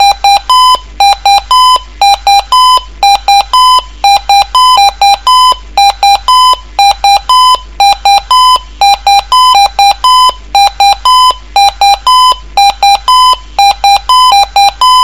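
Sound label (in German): Einsatzton, Alarmtöne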